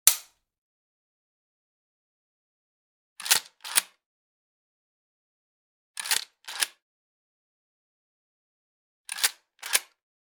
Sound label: 12 Gauge Shotgun Dry Fire, Unload; Hollywood Edge; Heavily Armed